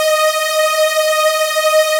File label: supersaw